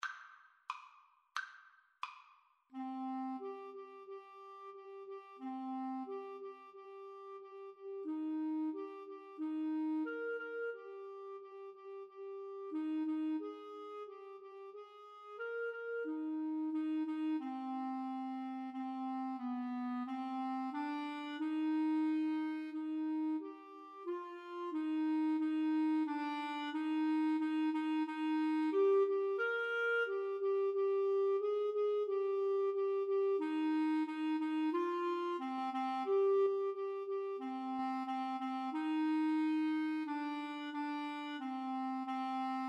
pavane, Clarinet Duet

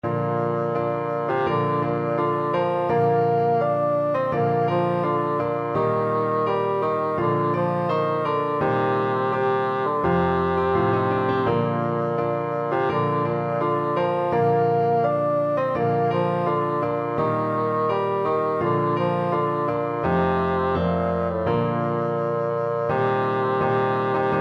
scotland the brave, bassoon

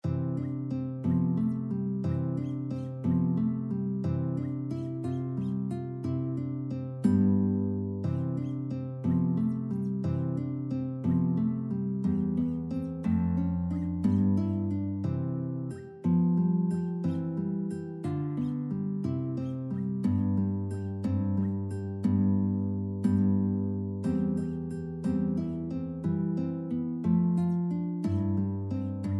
slumber song, guitar; dorn

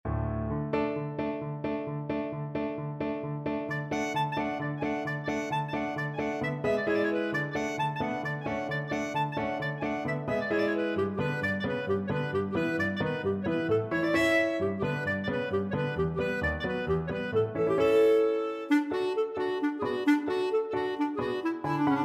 bartok romanian folkdance clarinet

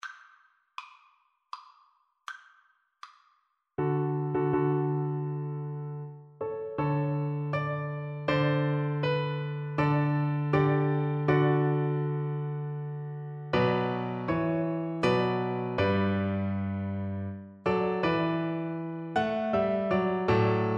nocturne, Double Bass

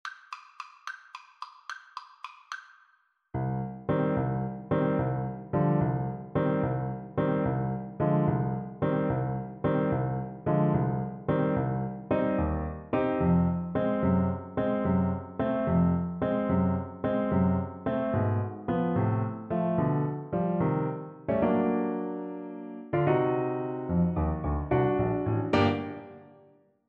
Baritone Saxophone

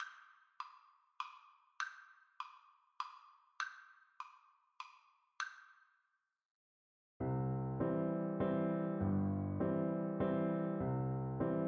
strauss vienna viola

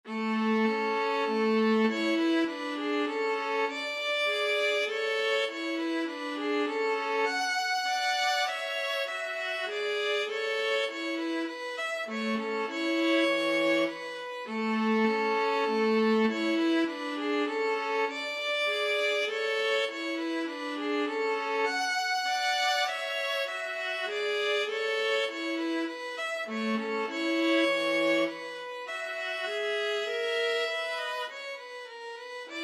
violin viola duet

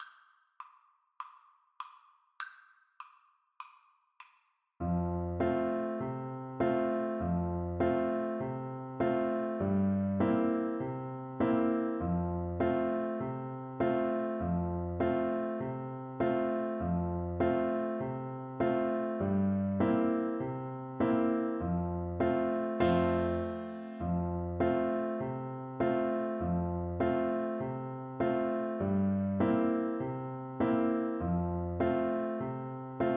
merrily we roll, flute

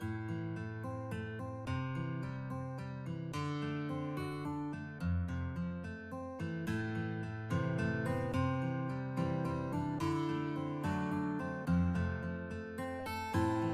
guitar duet

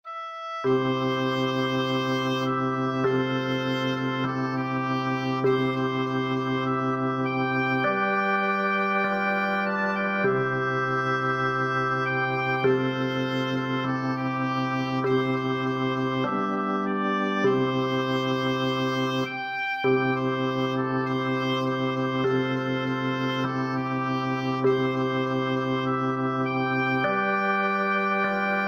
swing low, oboe

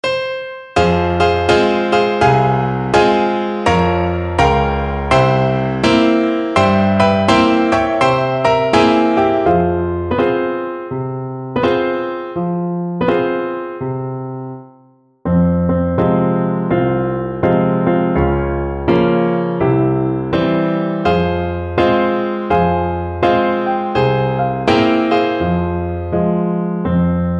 Saxophone

